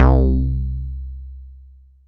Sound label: MULTIBASS, Dance Mega Jungle Rave; 90 s Sample CDs; Best Service